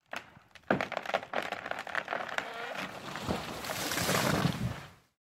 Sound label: tree fall, lumberjack